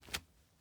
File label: masonry jump